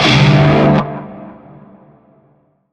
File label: Shawzin Pent Maj Chord; Warframe; Narmer